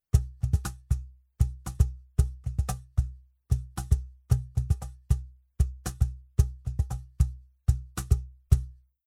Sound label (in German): Cajon Groove Deckenplatte Cajon Pitch Control zu unbearbeitet